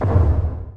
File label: Needle nose step; PC Gamer IT CD 31; Defiance